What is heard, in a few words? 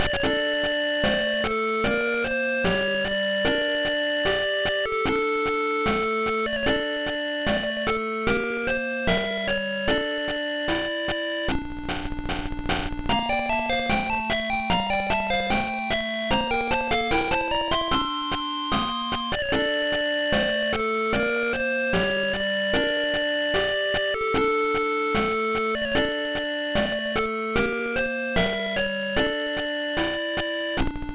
Church; Phantasy Star Collection; GBA